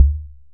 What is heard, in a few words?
impact Soft heavy; Kenney's Sound Pack; Impact Sounds